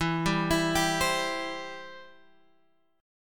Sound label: E Minor Sharp 5th E down Guitar Open G